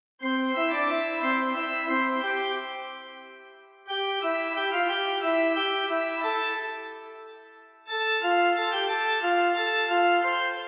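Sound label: F C Pipe, pipe organ; Bach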